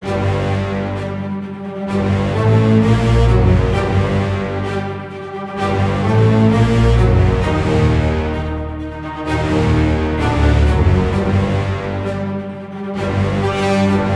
Epic Battle No Drums; Dual Blade: Battle of the Female Ninja

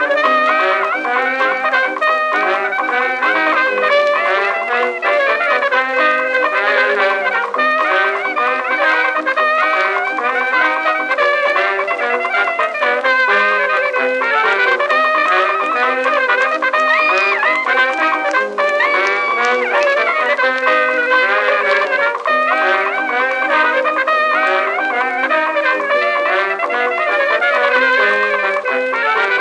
jazz loop; The Ship Murder Party

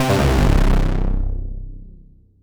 MUSIC EFFECT Solo Warm Synth Negative; startide macos switch windows gamerip 2017

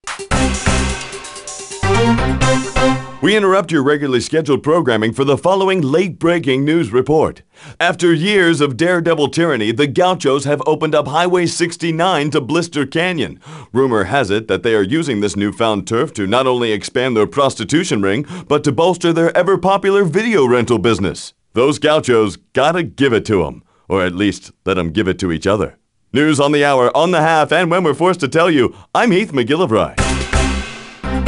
News Open

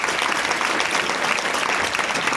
ambience, applause small; birthday party bash; wii; Spanish

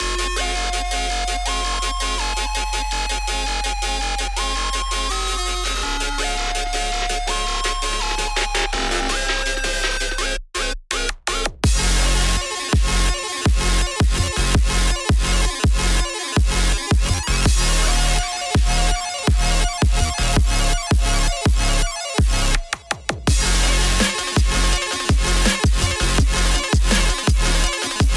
(Spooky Mix) (Instrumental)

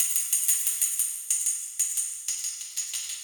tamb

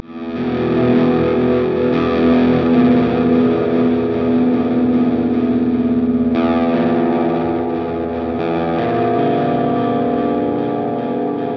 Forest Grunge

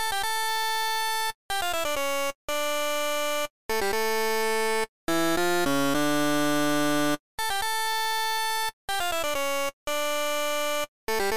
Ringtone; Gyakuten Saiban 2; GBA

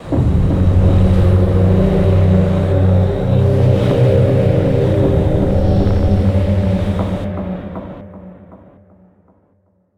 Game Over Drone

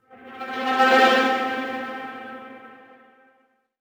bgmusic transition explore; iron marines android ios mobile 2017

